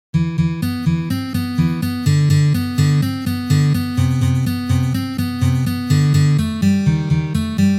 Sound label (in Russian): taby osnovnogo riffa